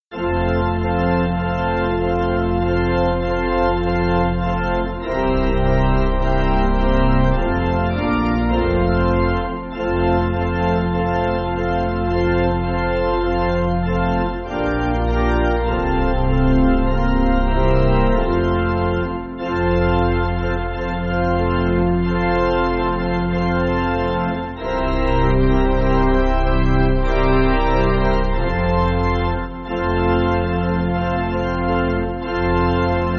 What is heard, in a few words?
Pipe, church music